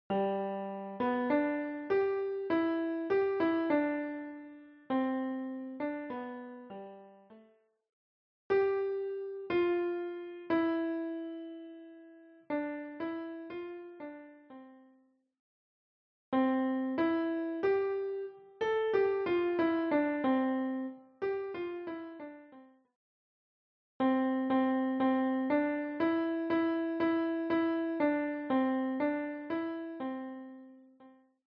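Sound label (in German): Kinderlieder, piano; Kinderlieder Vol.II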